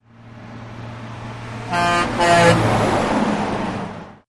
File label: Truck Doppler Horn